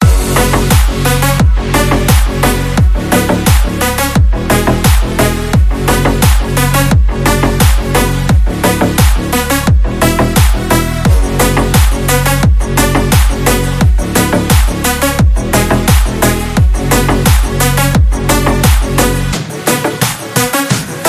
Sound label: Car Music Navi